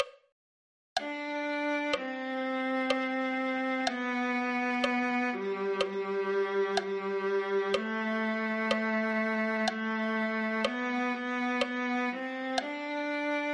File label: viola